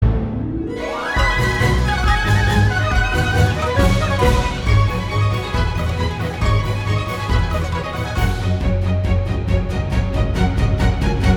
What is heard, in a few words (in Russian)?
Мелодия; из мультфильма Панда Кунг-Фу